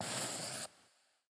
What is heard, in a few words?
drawing